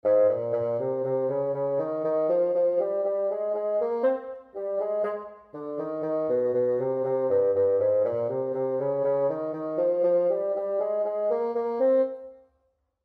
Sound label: Bass Clef Example